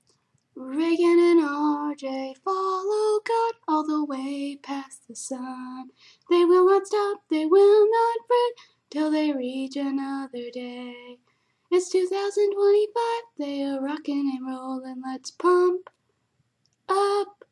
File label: Theme Song